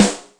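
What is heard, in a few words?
RIM natural, Acoustic Snare, Yamaha DTXpress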